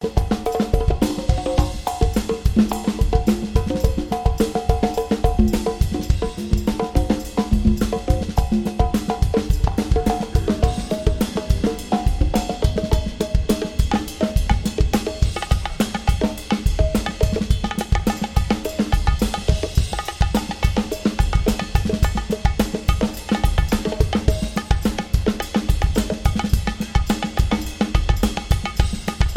Drums

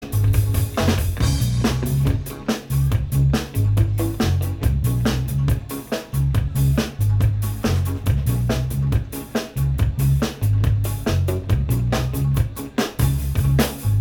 Improv Jam

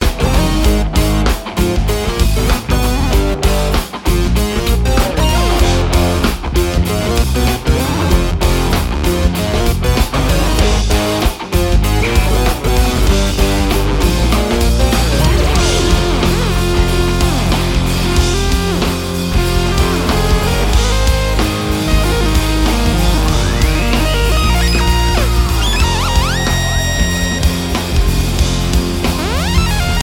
EWI 4000

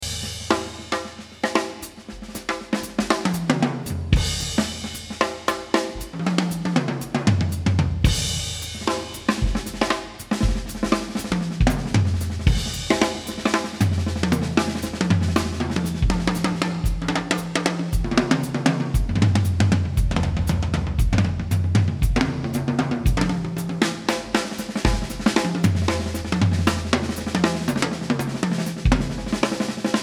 Drums

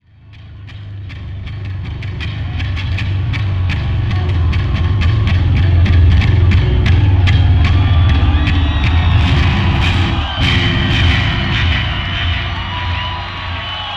Bass Solo

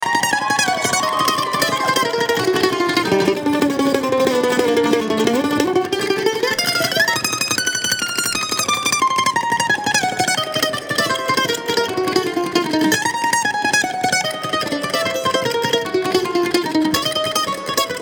Kentucky Mandolin

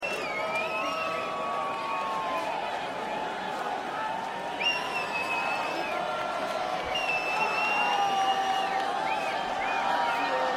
Crowd

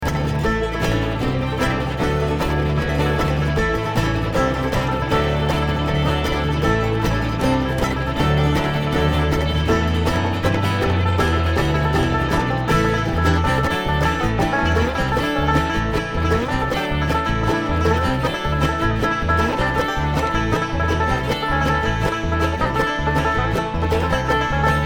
Traffic Jam

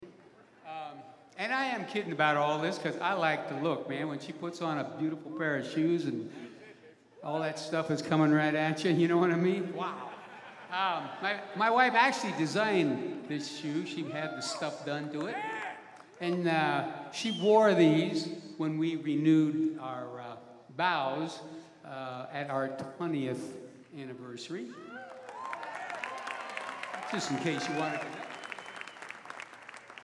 Guitar Moment

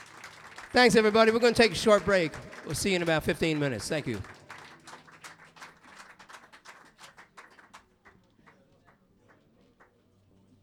Crowd

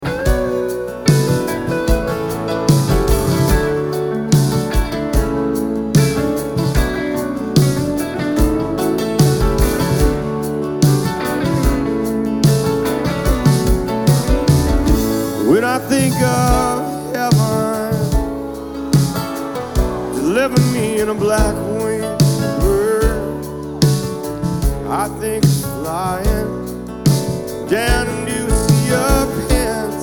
Acoustic

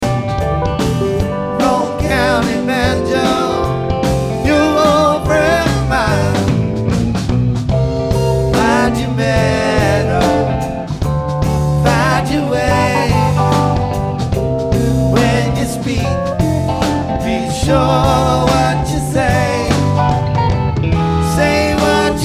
Banjo

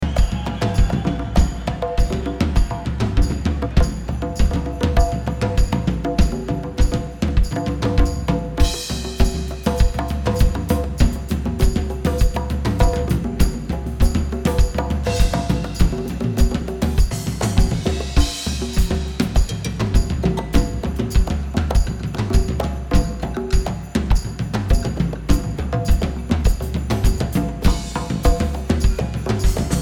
Drums

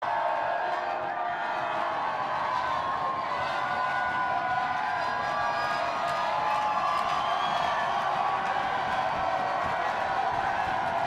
Banter Crowd

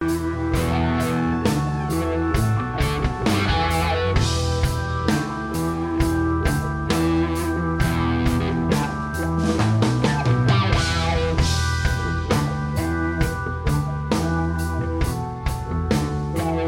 Machine Gun Instrumental

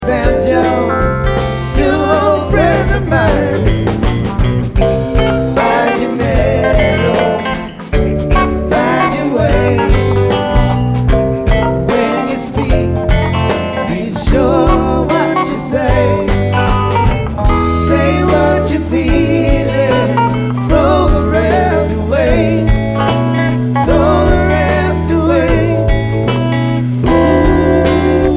Banjo

